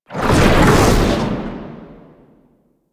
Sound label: Perfect Heavy Attack Activate; Warframe; Weapons; Melee